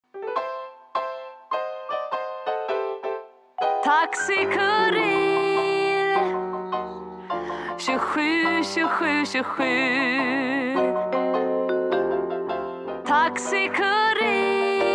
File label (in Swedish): Jazz stil; Taxi Kurir